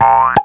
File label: attack; SKIPSKIPPER